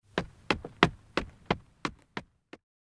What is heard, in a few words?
Footsteps Wood Hollow Run